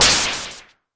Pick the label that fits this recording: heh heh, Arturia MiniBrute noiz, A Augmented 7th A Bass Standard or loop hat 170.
Arturia MiniBrute noiz